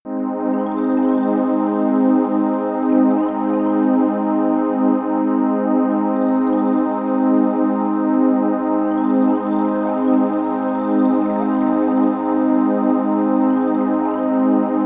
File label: relaxing energy, music elements